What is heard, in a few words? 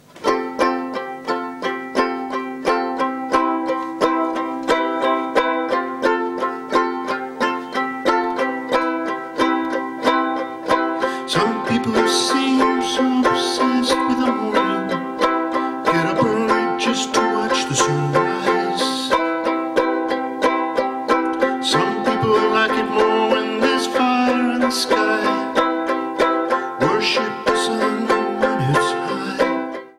Live Banjo